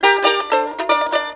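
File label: charango toot; Musical Instruments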